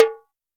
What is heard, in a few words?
can; Roland R-70; Perc